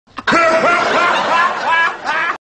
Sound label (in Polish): smiech